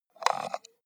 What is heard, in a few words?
computer mouse wheel scroll fast